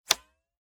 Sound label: button up